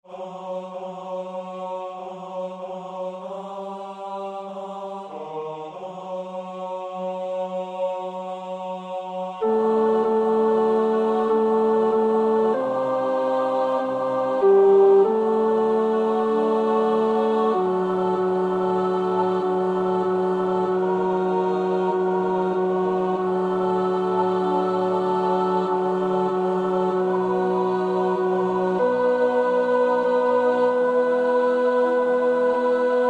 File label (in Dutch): tenor 2; engels